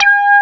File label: FRQ SQ DECAY; AKAI S6000 CD-ROM - Volume 1; SAMPLE WAVE MIXING 1